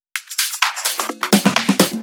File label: Fill 128 BPM; Vengeance Samples; VEH1 Loops